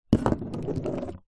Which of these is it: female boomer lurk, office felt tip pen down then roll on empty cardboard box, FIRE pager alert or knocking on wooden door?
office felt tip pen down then roll on empty cardboard box